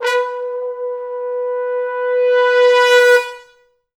Pop Brass; Best Service ProSamples vol.19; AKAI

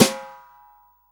SD, REAL DRUMS, SWING KIT

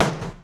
Foley Mid, Drum Bits, Sweeteners

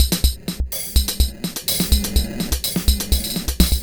Percussive, Drums & Percussion, Beat Components; Electrocution

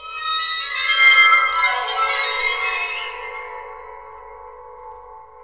WATERPHONE; WATERFN

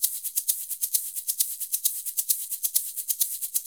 132, Percussion works, LOOPS; Sampleheads; NYC Percussionworks; 90 s Sample CDs

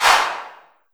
LARGE ROOM 808, REVRB

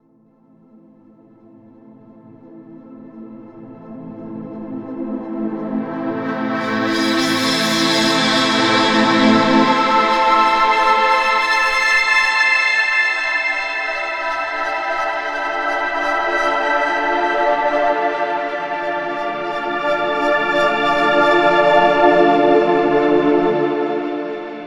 Keys, Drum n Bass; KJ Sawka - Drum n Bass & Beyond; Synth & Melody

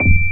BOX ECHO D5, MUSIC BOX; AKAI S6000 CD-ROM - Volume 1

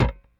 Rickenbacker Bass; Airwindows; Rick-Xtras

